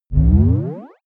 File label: turn on off